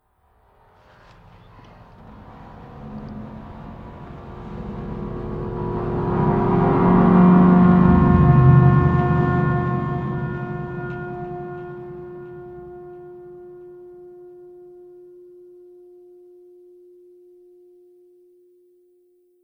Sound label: mood; inFamous; PS3